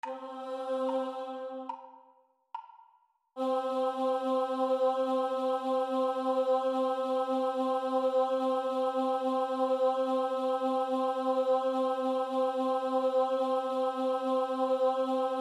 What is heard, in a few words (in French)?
Chant